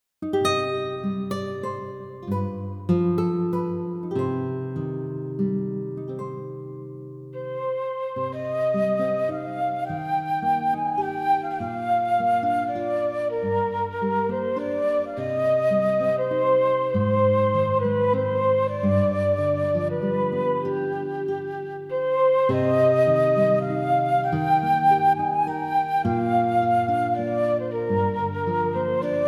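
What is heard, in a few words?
play referencia flute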